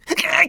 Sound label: push; Scrat